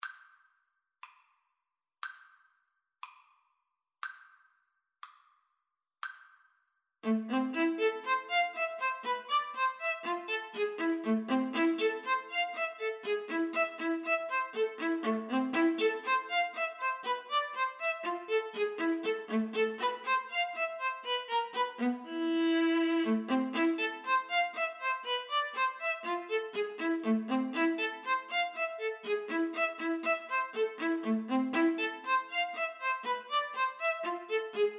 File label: Violin-Viola Duet; dvorak op98